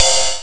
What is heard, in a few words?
ride cymbal, Amiga Soundtracker; ST-XX Amiga Soundtracker Sample Packs